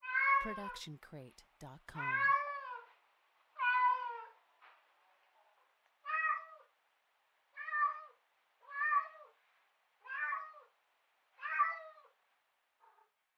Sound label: cat meow; SoundsCrate